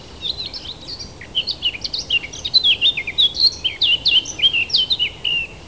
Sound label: Sylvia atricapilla